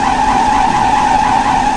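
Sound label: wet tarmac; RallyC; SURFACES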